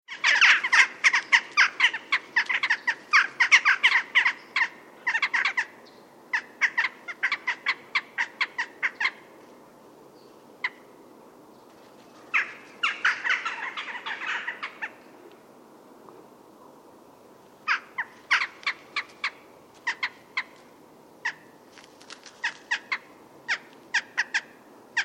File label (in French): choucas chant